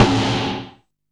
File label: Snare, Drum & Bass; Claps; AMG - Prototype Drum & Bass; Snares